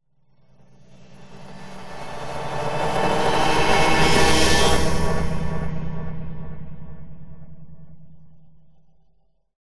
pulsating flyby swoosh; the sound pack tree

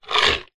horror creature single bite crunch large

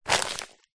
seeds packet black chia pick up; food

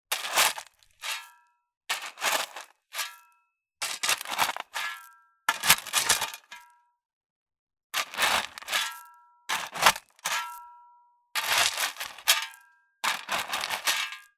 industrial shoveling small stones

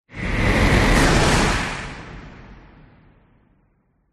whoosh; orlando lanzini

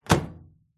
household microwave door close

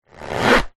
household squeeze mop squeeze dry

